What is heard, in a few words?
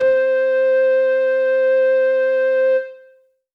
Synth C, Synth Prophet One Shots; Electronisounds